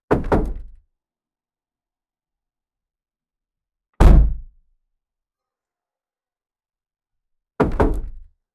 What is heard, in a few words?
car door gets slammed